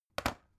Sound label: folder close soft